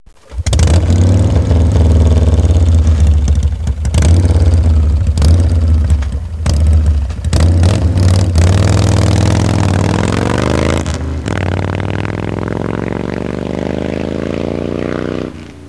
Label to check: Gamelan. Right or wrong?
Wrong